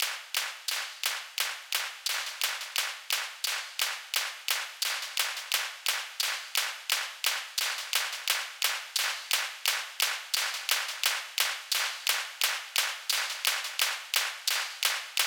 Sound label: clap Buildup